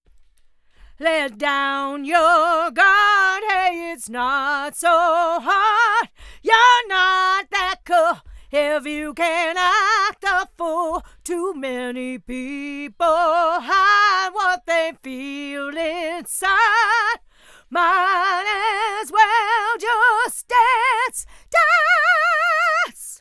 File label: EQ Lead Vox